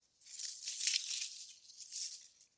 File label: Leaves and Twigs Rustle; SoundsCrate